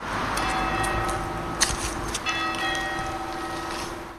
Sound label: strang bell; forfar